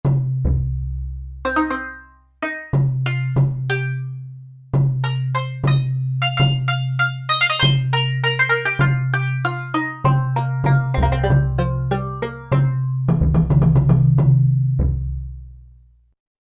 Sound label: Japanese, Korg MSC-1S; Korg MSC-1S Variety